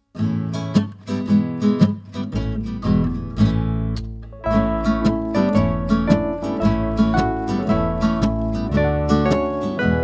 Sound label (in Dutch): bim liedje karaoke